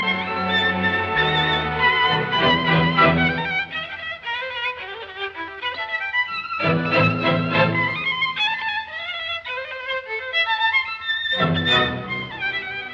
Violin Concerto in E minor, Allegro molto appassionato; Mendelssohn; Op 64; Joseph Szigeti